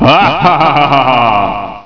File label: HAHAHAHA; SCARY